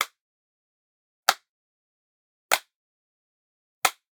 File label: Generic Click Plastic Small